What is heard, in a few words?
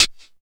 HAT VERB; Phat & Phunky; East West